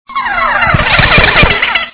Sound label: phase